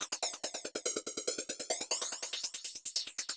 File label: 142 Techno Hats Flange; Dance DJ; Drums; Pro Tools Essential Loops; Digidesign